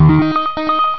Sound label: charge; SNOW FORT; SNOWHENGE